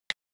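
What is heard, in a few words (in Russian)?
звук щелчка iphone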